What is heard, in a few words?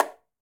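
Tamborim Finger; Latin Percussion; Drums; Drum Hits; Tambourin; Ableton; Factory Packs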